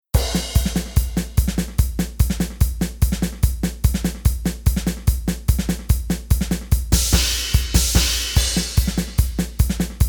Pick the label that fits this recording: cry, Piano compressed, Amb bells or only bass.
only bass